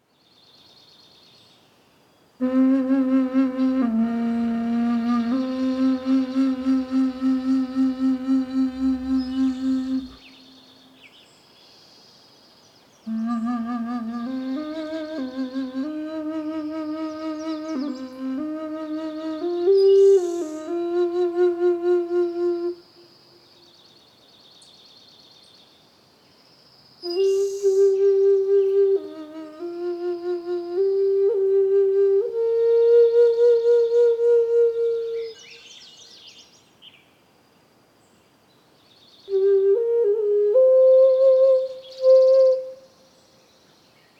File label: Smooth Jazz